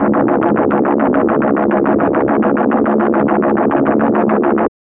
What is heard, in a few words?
retro sci fi flying boat, synthwave